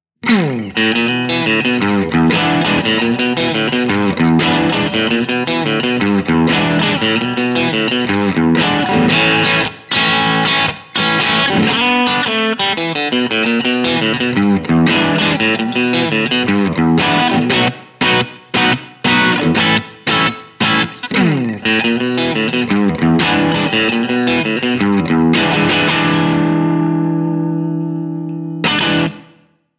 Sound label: characteristic sound; jensentone